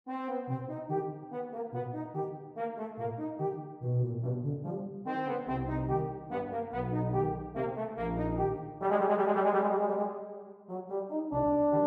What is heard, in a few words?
Capricious Waltz